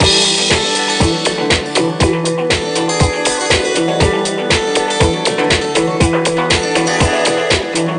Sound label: Music Dance; Wedding Planner